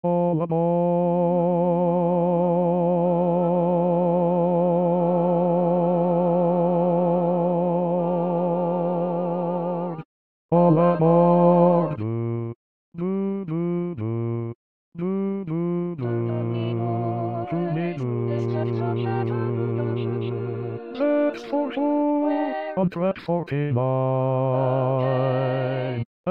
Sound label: bass